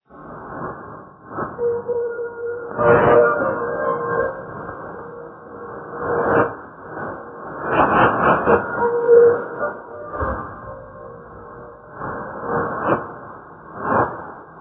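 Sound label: radio, 3987 kHz; RRI Manokwari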